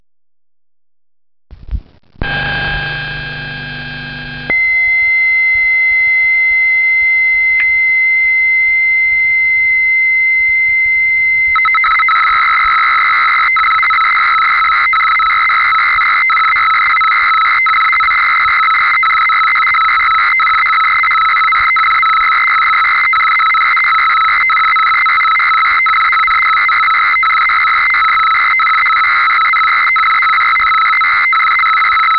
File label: jupiter8 space telemetry; Computery or Science Fiction